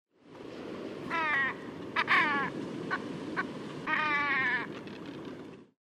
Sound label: Iwamale